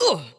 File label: spirit death; FlyForInsanity